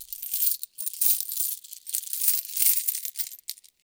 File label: coins rustling; soundscrate